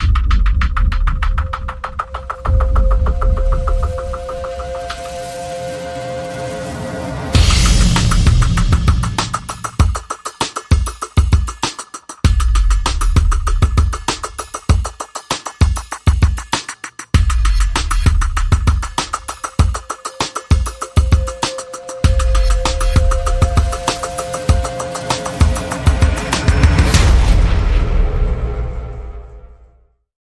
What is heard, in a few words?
Drums and SFX